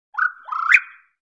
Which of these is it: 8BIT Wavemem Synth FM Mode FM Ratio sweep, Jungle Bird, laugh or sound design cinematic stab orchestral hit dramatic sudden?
Jungle Bird